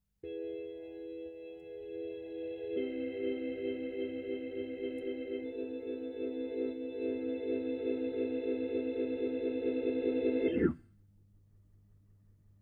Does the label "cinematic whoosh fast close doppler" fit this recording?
No